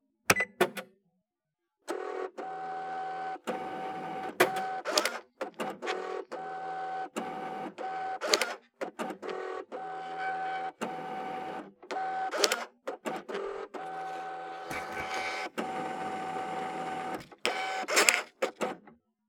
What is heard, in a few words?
register; ちょっと古めのレジ音（1）